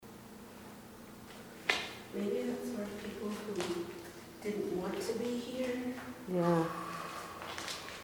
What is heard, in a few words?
scream; undisclosed sanitorium